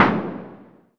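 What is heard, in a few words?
snare drum can hit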